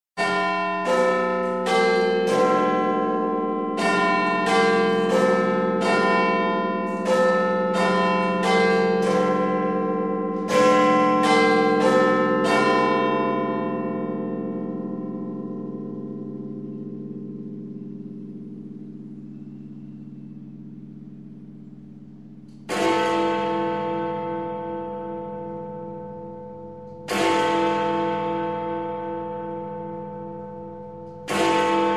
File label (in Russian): chasovaya bashnya zvon kolokola 12 udarov v kolokol; zvuki novogo goda; audio effekty dlya prazdnika